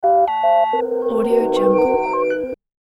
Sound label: master control beeps